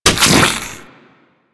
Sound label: Venom Tick; Warframe; Saryn